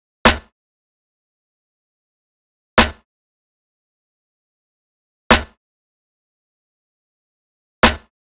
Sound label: Snare 95bpm G-